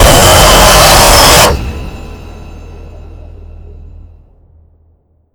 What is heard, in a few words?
scare; VERY SCARY SOUND!!!!! (from airdrops and custom maps)